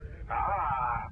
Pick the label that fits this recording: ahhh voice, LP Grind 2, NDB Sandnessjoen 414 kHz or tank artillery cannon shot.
ahhh voice